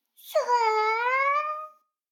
シコシコ音声; 逆時間停止されて

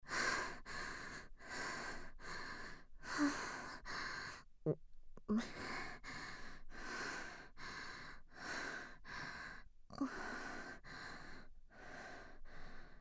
狐吐息

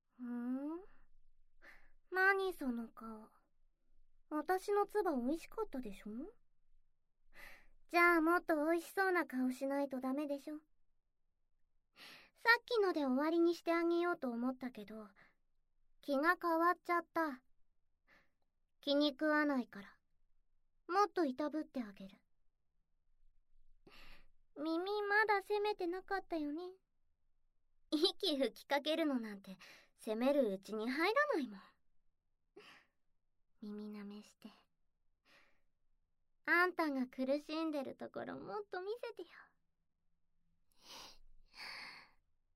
耳舐め, ASMR

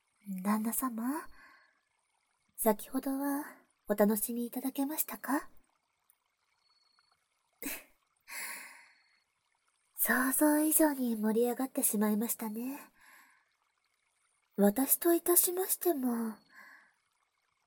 ディープキス

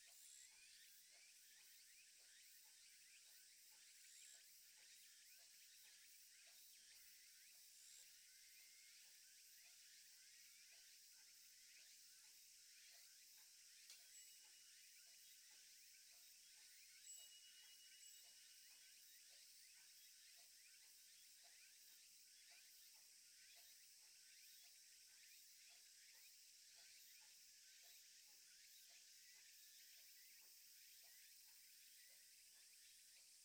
風鈴、蝉とボンボン時計; ループ可

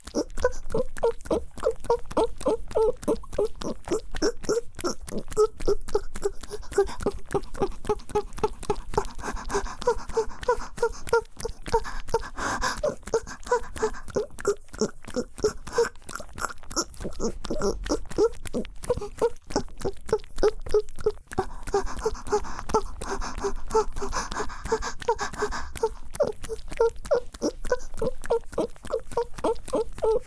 田舎の古宿ン娘の夜伽 宮崎弁 母の喘ぎ声無しバージョン ギシアンループ

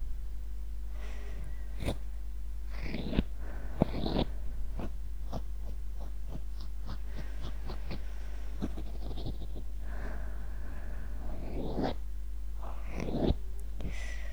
綿棒で耳かき（右）＆吐息, ASMR

